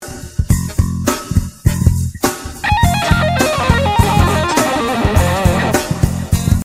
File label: Lick